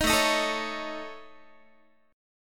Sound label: A Major Flat 5th A Guitar, chord; AMb5; strummed